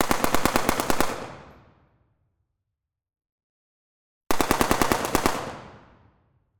AK5 7,62mm - FIRING - Large Hall - Long Bursts - 3m Behind Left; MKH418S; MS Decoded; Pole Position; The Indoor Gun Acoustics 2 Library